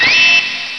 cam alert; mgs

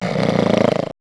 snore; mgs; radio

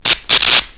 spark; mgs

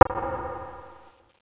tap; mgs

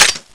clip out, pdw

